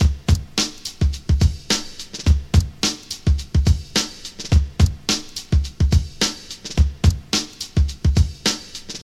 106 bpm drum loop sample c sharp key, kick tuned to the C# note